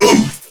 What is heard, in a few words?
Pain; Warframe; Corpus Crewmen; Crewman C; Dialog Barks Battle